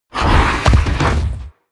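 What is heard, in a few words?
monster rabbit ugly death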